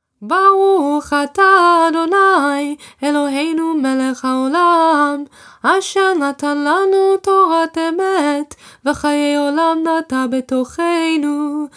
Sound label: Torah Service Torah blessings AFTER; rosh hashanah